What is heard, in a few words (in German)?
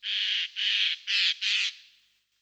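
Eichelhäher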